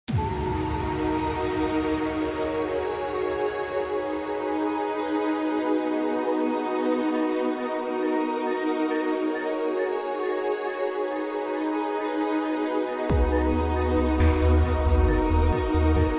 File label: NEW AGE